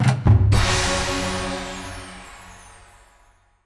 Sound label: Fanfares, Success